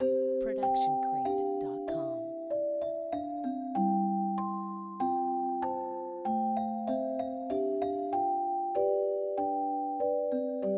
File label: celeste lullaby